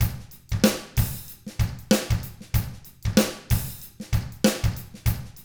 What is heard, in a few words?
95 FUNK, Drums; ILIO; Double Platinum Drums 1